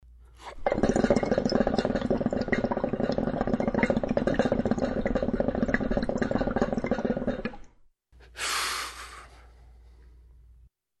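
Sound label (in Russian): курение кальяна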